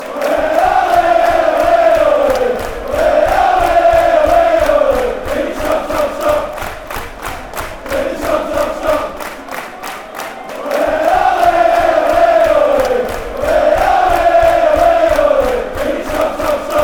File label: chant; billy sharp